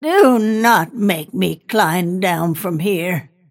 cast pain; snapfire

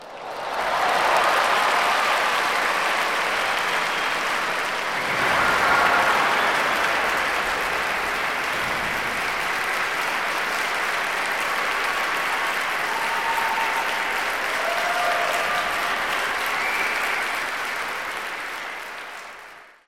crowd, spectator

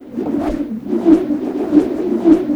treasure spin

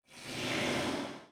crit spatter; weapons; dota; phantom assassin

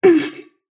sylph pain; dota; dark willow

